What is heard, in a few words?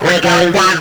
Vocal Phrases; Vengeance Samples; Vocals